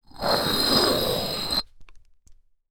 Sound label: Sand Pebbles; TOOLKIT vol.2